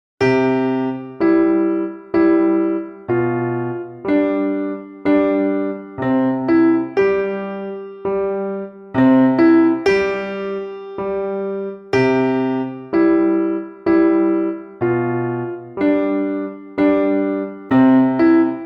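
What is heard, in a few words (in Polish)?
gitara; ognisko; podsumowanie roku 2013/14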